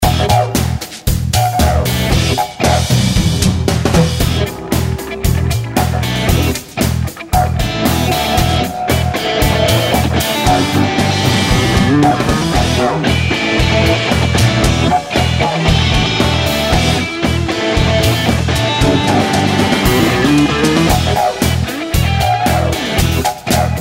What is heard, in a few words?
Free Spins Oz Rock; Wacky Waves